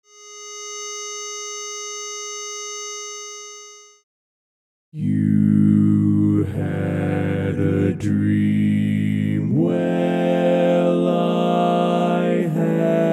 Bass, Learning Tracks; Barberpole Cat I

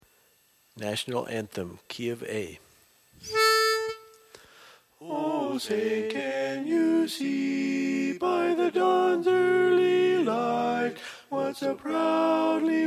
Tenor, Learning Tracks; Star Spangled Banner

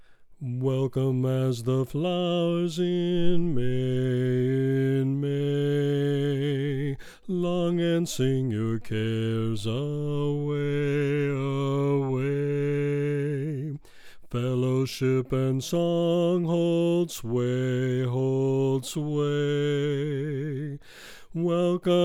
Bass Flowers In May

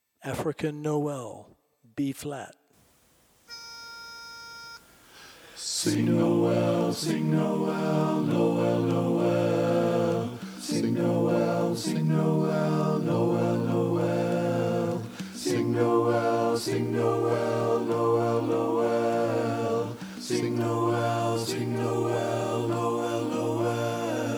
African Noel Hi Bass, Learning Tracks

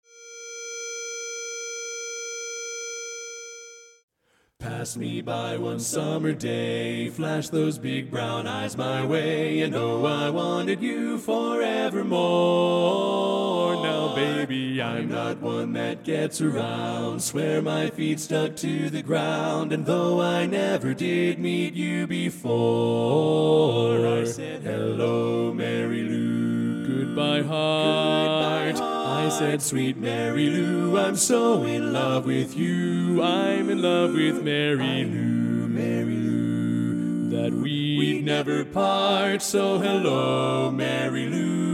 Bass, Learning Tracks

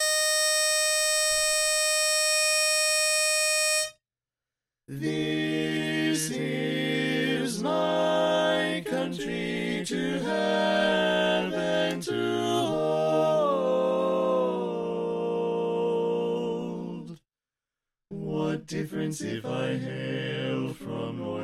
Bass Left

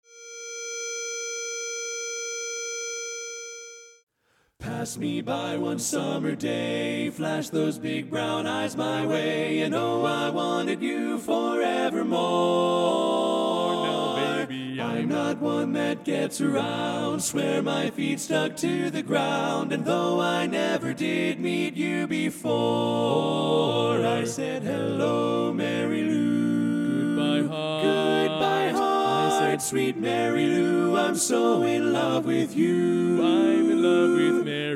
Tenor, Learning Tracks

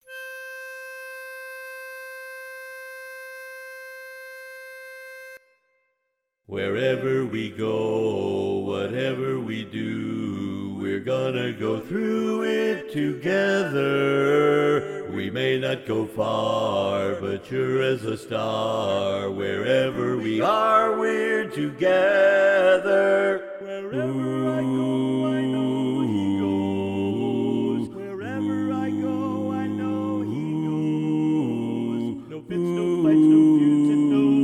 Bass

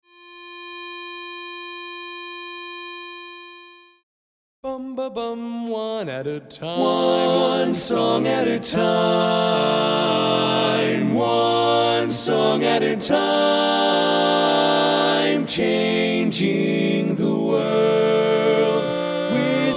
Baritone Left, Learning Tracks

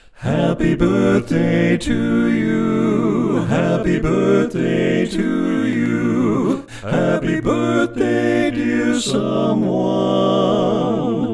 Happy Birthday Full Mix

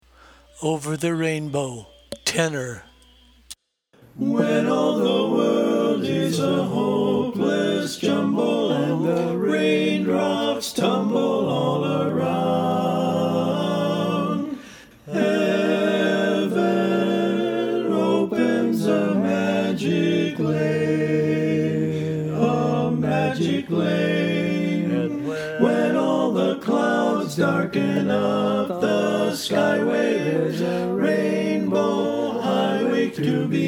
Tenor

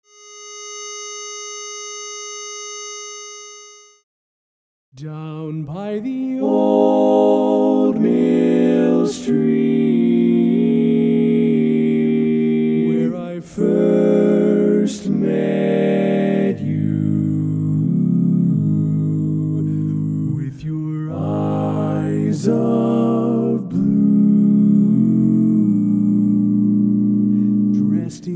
Bari; Learning Tracks; Barberpole Cat I

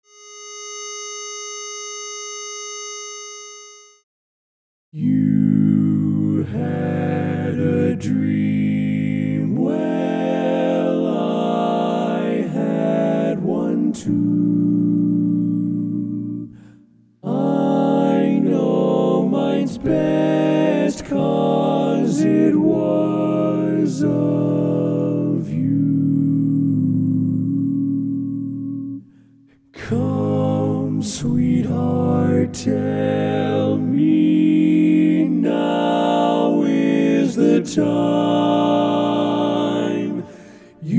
Tenor, Barberpole Cat; Barberpole Cat I

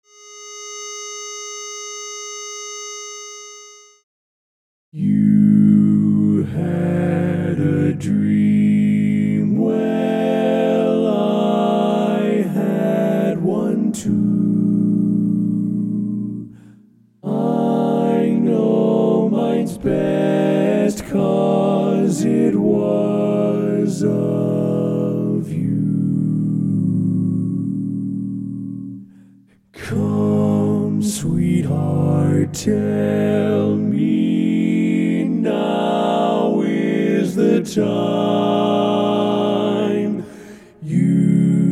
Bari; Learning Tracks; Barberpole Cat I

